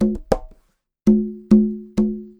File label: Conga Loop (100BPM); musicradar